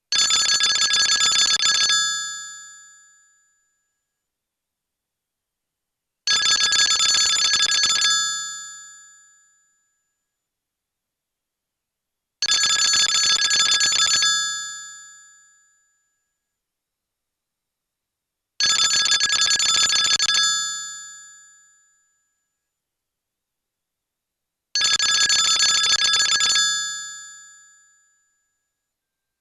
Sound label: Old American Phone, ringtones; old phones